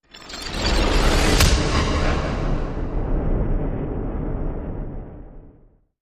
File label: Reverse Rattle And Hard Impact Rumble Sweep